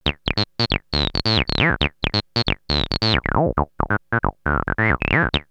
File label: 303 Loops; X-Static Goldmine